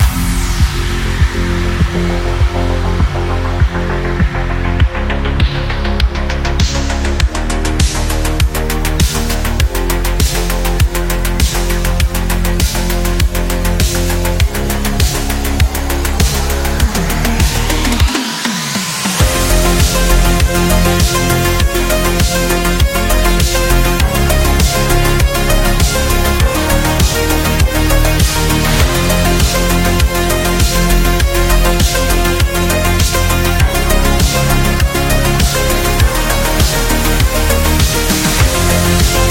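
Retro Futuristic, Modern lo-fi and retro background music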